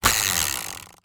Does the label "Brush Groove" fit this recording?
No